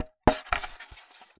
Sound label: Polystyrene Rattle